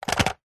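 leisure remote control car remote plastic set down hard carpet; zapsplat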